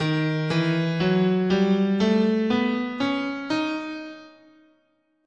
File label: D sharp Altered, guitar, scale